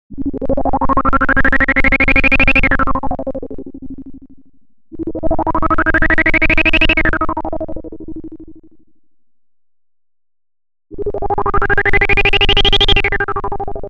Roland SH-101

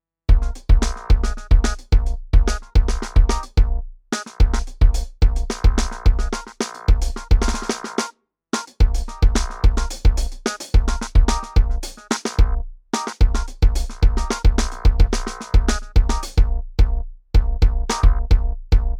Clavinett Jam

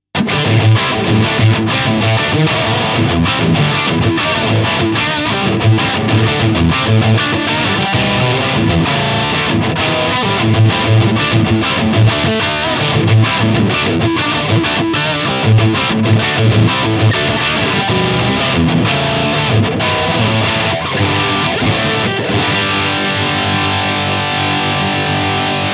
Lead

